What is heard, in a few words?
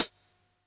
Hat Closed, 707